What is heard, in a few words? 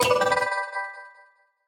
fullhouse